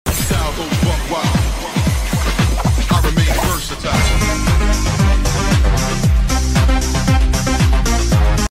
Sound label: phonk